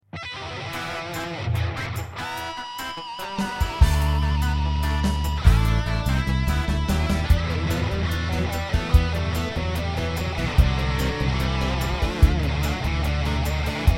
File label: VYPYR30 and SANPERA2 song